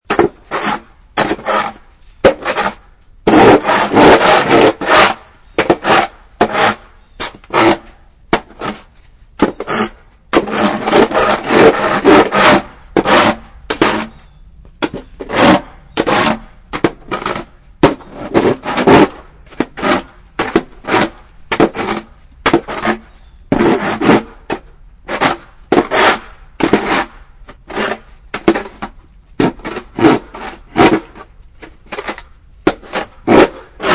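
Scraping Snow Sidewalk, Loud intense scrapping of shovel on icey sidewalk.; Scrapping Ice Off Sidewalk; Yard Tools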